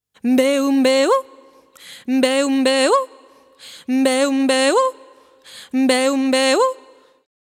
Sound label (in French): chant voix